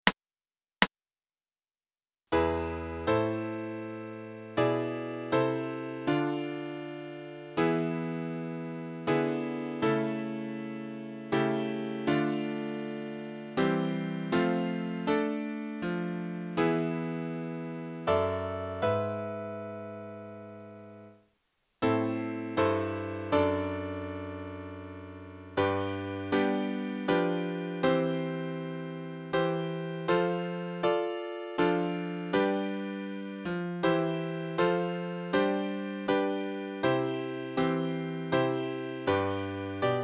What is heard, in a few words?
White Piano